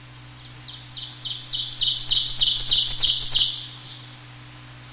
ovenbird

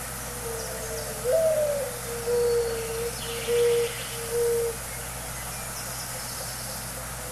mourning Dove

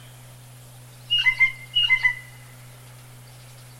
bluejay

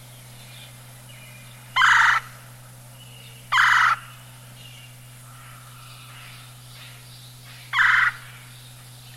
woodpecker, songs

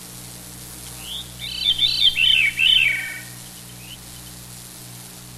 veery

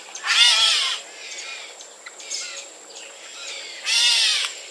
catbird

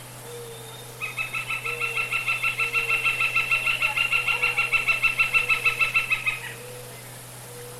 flicker, songs

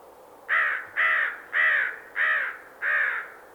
crow

